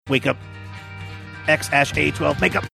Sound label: singing wake up